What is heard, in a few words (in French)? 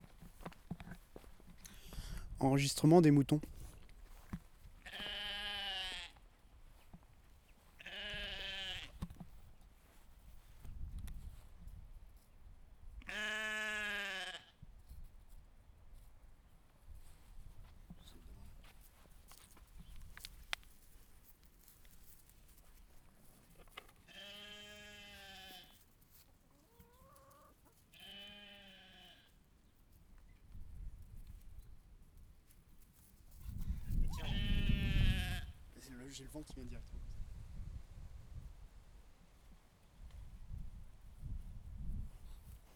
Moutons - Plein Air; Animal